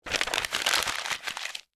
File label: paper rustle; Books and Paper